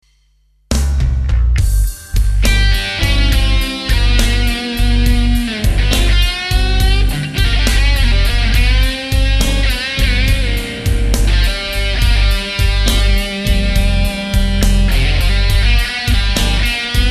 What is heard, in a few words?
tube blues